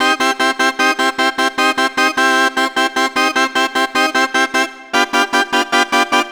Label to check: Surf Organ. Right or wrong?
Right